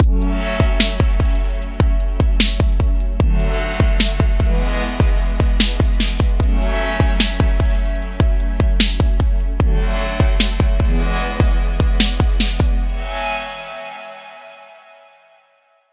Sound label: hip hop trap beat presence mixed; Deep kick drums, snappy snares, and fast hi-hat rolls